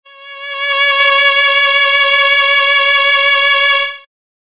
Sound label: Fear